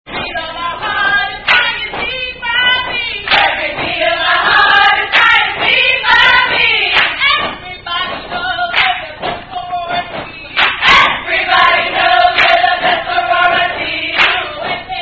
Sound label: Sorority Song; Every Beat Of My Heart Is Crying Z Phi B - Lambda Lambda Zeta Chapter